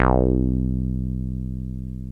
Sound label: casio, Synths; Notes